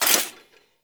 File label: Mech, Rifle; Thanotech; Trumna Prime